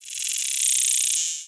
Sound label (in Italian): Insetto, animali